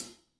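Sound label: hats closed; Sonic Reality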